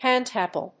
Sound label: handclap